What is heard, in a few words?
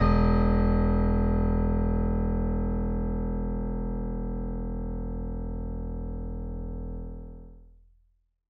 Acoustic Piano; InVision Interactive; Miroslav Vitous